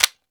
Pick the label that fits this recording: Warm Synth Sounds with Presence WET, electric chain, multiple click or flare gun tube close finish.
flare gun tube close finish